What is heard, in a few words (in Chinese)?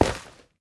菌岩踩踏声